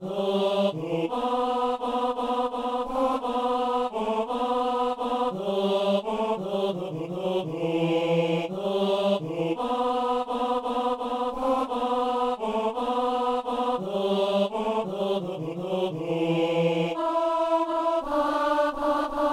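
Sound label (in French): ténor; tourdion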